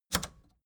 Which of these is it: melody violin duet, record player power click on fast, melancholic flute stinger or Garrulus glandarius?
record player power click on fast